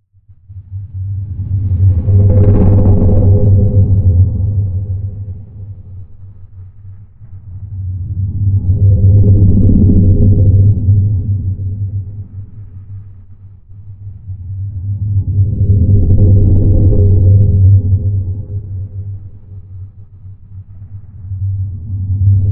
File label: rotating earthquake; flaechen